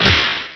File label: smack; gyakuten touhou